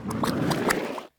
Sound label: goo trail; World Of Goo